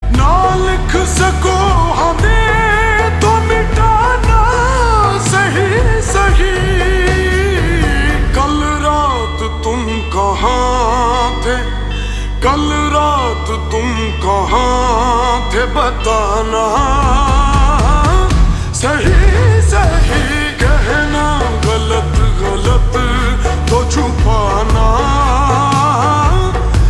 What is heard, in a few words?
Slow Reverb Version Ringtone